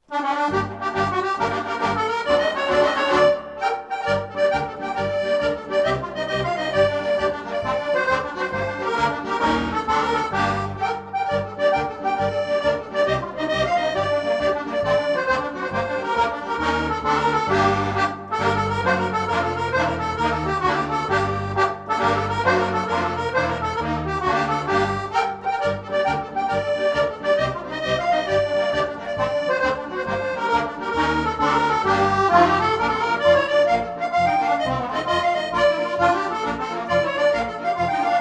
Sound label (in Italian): Tarantella napoletana